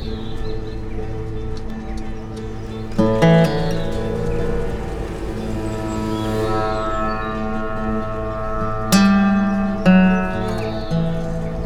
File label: Village Poor